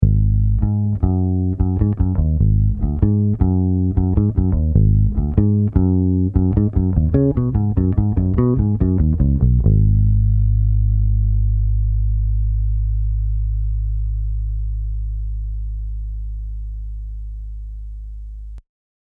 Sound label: Middle Pickup Solo